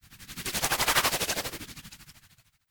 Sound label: Coral Brinicle Water